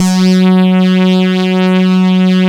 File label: SYN SAW, Roland JD 800; Sound & Vision - Gigapack I CD 2; JD 800/990